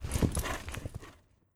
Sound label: Huntress Run Tiger Run; Legend of Keepers